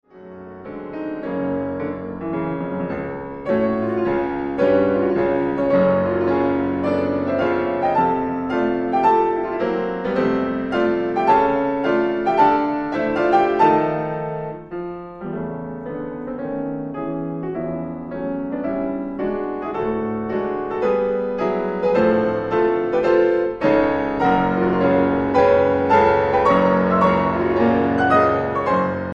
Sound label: Preludes for piano Andante Maestoso